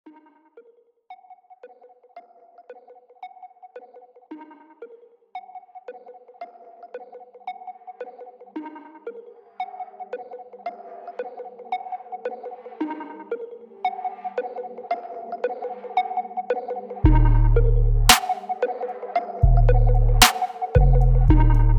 WILD ANIMALS FREE BEAT

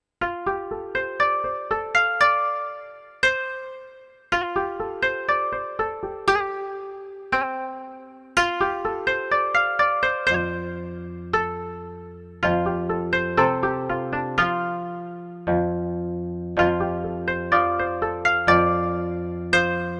Chinese Music, Zen Music

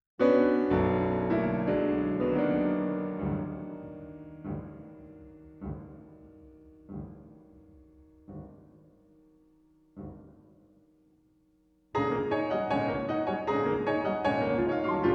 piano

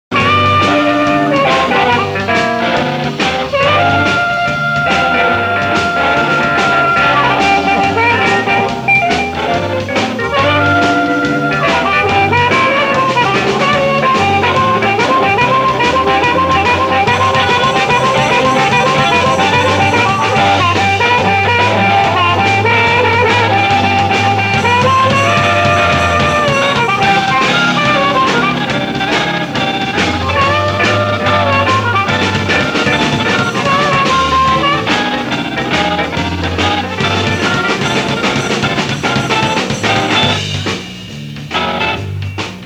Blues Band, Live Version

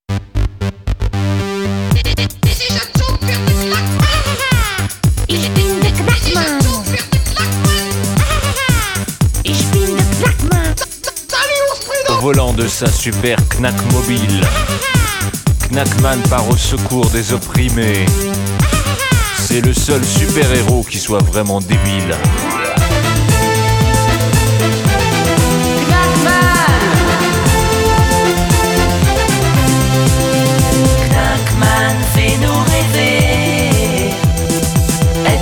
Techno

